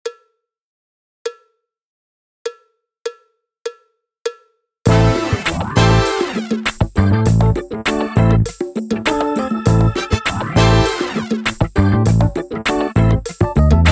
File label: drumless